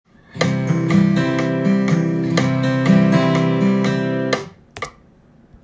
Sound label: second guitar